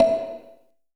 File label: FX BLOCK; AKAI S-Series CD-ROM Sound Library VOL-5; FX PERC